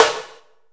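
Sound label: subtle reverb snare sound a key, tuned to the A note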